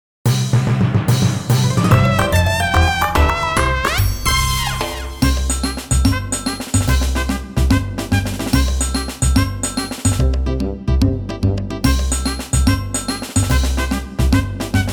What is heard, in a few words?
Stage Clear Jingle